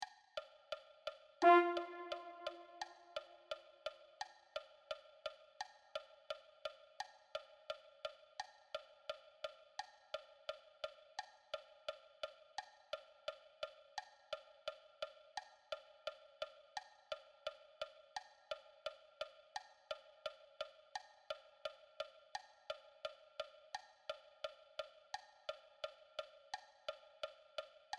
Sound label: Mellophone